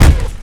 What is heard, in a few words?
dog footstep run; Half Life 2